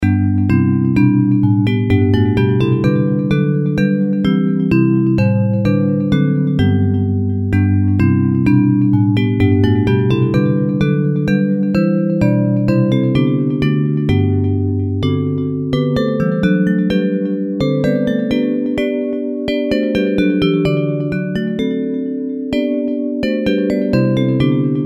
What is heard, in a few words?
Bells; Hymns